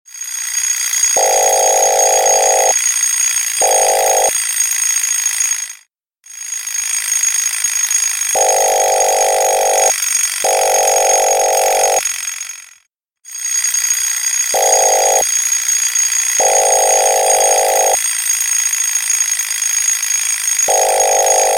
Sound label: Wake Up Alarm